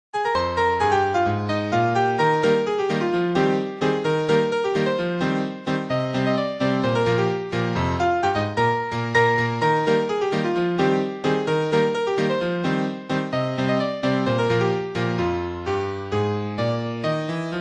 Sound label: Ragtime Remix; Welcome Song